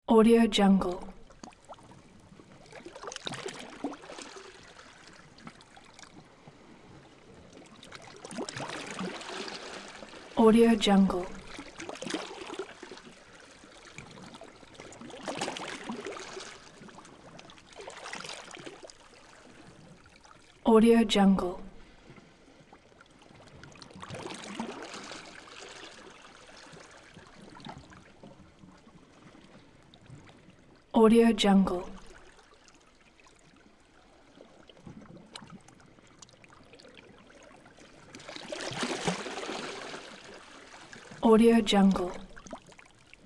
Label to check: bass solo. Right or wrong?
Wrong